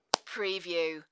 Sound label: Gum Bubble Pop